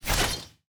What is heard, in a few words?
Armor